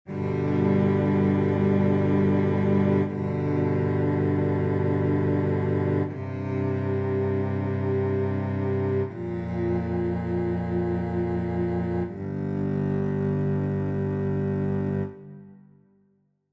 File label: fracture end music; Fracture End Violins